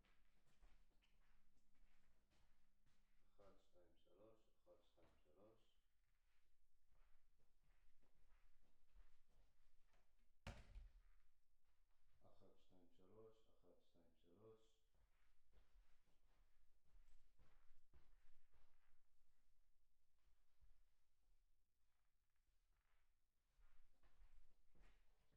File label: PERC OH